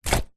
household wet cloth drop into ceramic sink splat squelch; zapsplat